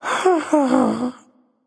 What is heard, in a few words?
sigh, voicepack; smite; jorm